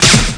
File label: MAIMER, WEAPON; Messiah